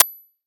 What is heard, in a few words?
empty; svencoop; ins2